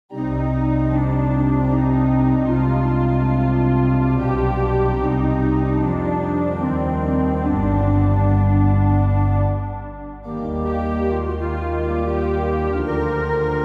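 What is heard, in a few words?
hymn, organ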